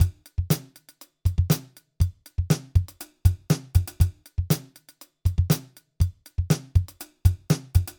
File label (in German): drums ohne limiter; softube valley people dyna-mite